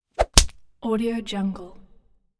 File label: martial arts whip crack